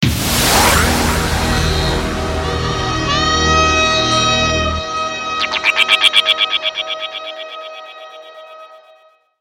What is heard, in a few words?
Mystic Sms